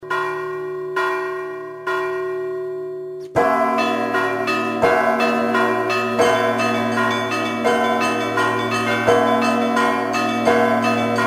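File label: bell ringing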